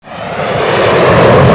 jet akoff; Toyshop on Earth (1996); OmniMedia

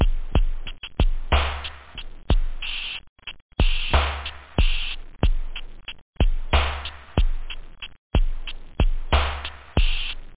etronic, loop house

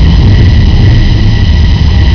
engine; Phoenix

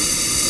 Engine; PC Gamer IT CD 48; Big bang

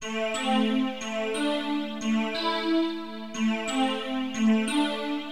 STACK, HipHop Keyboards; LiveAct Pool 1

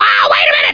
bump; Screen Wreckers

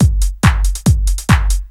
Tech A, Techno Drums; TechnoMusicMaker

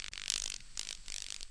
GRASS, nature; PC Gamer IT CD 40; zones

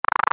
Sound roll

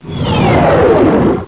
xwing in

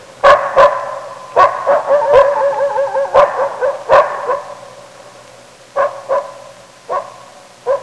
wolf puppies barking; Sound Effects for Multimedia - Volume 1

